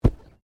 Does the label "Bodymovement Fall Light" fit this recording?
Yes